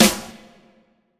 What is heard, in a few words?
reverb steel snare drum A key